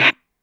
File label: Snare Lo Speaker, Stylophone Beatbox